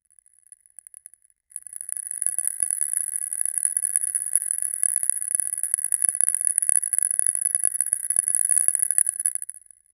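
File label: crystals clicling together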